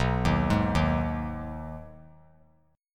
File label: B 6th B down 3C 3F 3A 3D, chord; arpeggiated